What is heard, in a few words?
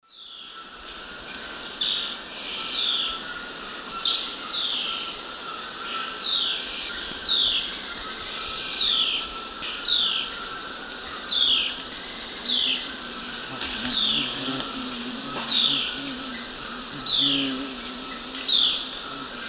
Myrmeciza castanea, calls pair; S. America; Eastern Lowlands